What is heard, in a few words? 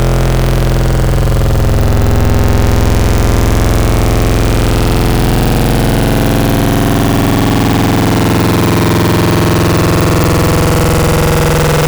FM buzz saw; Synthetic's Serum Collection; Echo Sound Works Core Tables